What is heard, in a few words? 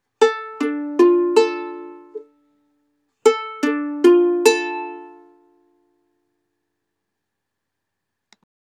Dm Chord